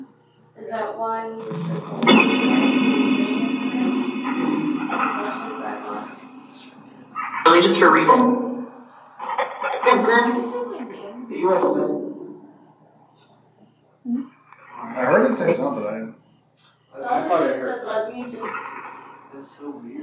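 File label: Train Station Phasma Box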